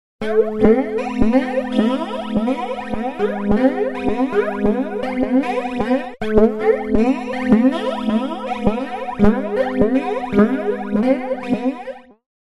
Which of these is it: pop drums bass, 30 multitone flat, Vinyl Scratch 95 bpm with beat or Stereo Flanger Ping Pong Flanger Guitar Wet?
Stereo Flanger Ping Pong Flanger Guitar Wet